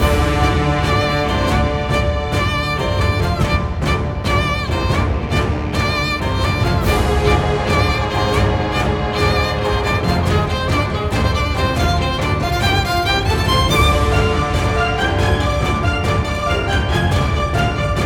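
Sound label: background music boss battle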